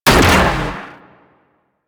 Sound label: Shotgun Fire; Warframe; Weapons; Corpus